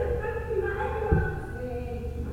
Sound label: mill water; Cumbria Remixed Sample Pack